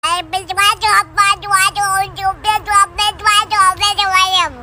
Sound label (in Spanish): guagua tiktok